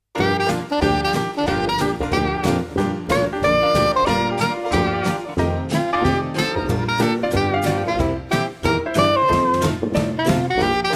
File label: Oldtime Jazz